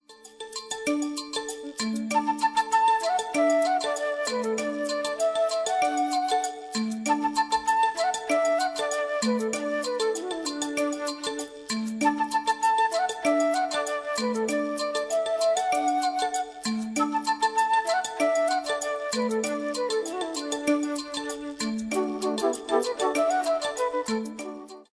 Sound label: Island Magic